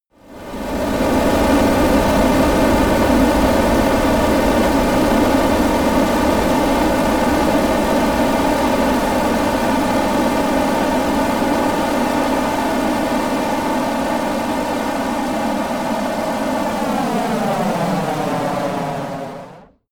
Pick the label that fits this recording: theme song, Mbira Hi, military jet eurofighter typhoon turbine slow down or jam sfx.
military jet eurofighter typhoon turbine slow down